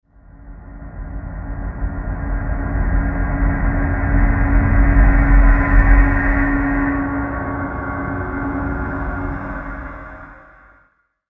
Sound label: Evil Life Drone; horror; Evil Sound Effects